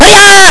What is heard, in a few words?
magic; shinobi